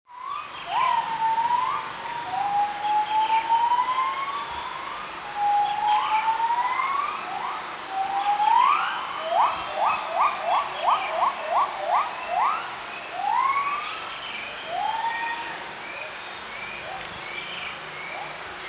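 Javan Gibbon, Heard calling from the densely forested slopes of Mount Halimun one morning.; Hylobates moloch; Mount Halimun, West Java, Indonesia.; Recorded by Yong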